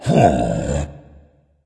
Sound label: sigh dragon; fafnir